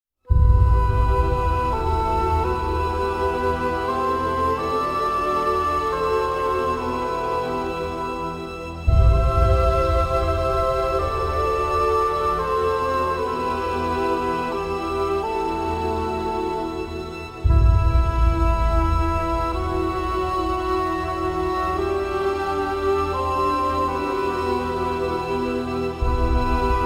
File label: music